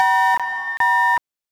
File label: sinewave